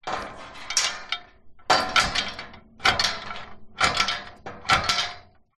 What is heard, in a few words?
industrial metal gate chained try to open movement